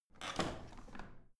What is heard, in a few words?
washing machine drawer out squeaky